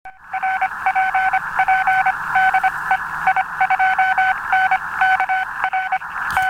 21mHz

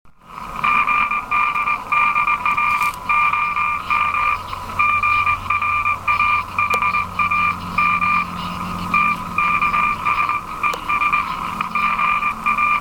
QSB; QSO на самоделках

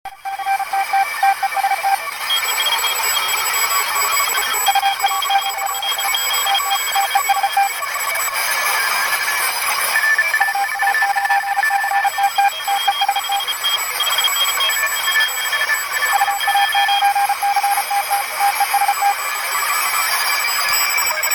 in test

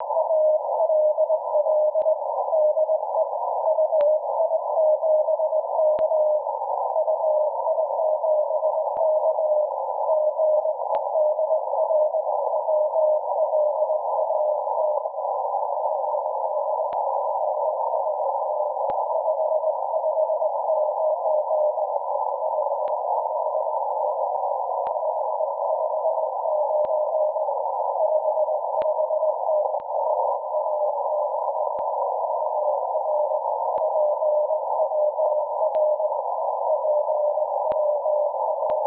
2-WAY QRPP

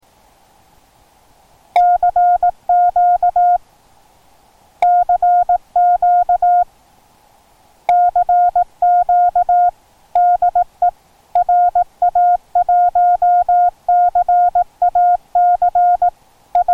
RX agc out

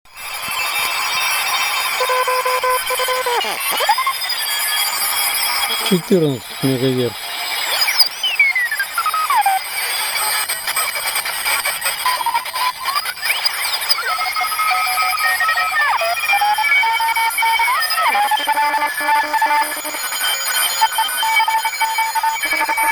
14 MHz test

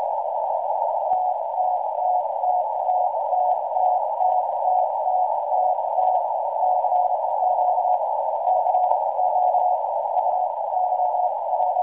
14MHz; Sputnik6P3S

